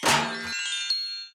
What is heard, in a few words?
sfx open oven; Dorothy And The Wizard Of Oz - Cookie Magic